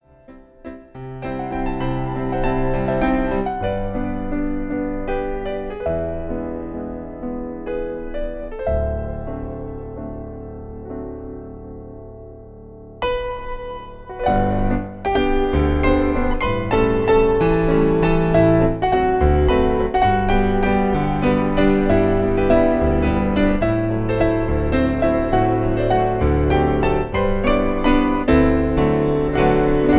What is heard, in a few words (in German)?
Liebeslied Walzer